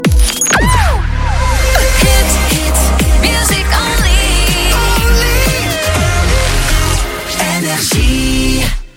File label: jingle shotgun id; nrj